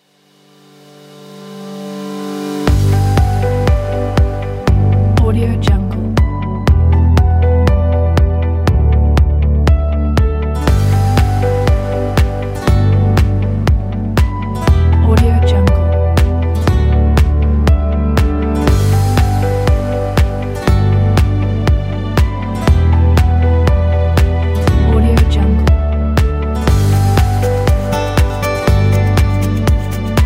nature documentary

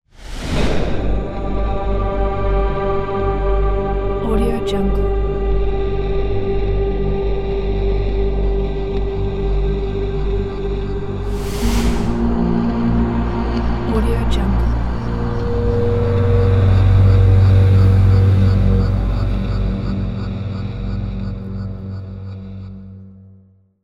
dark atmosphere ident

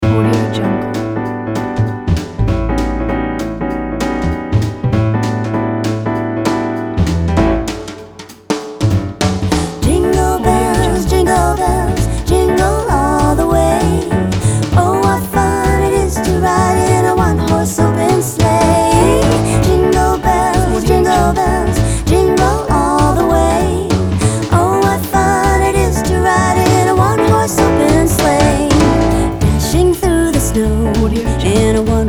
christmas jingle bells

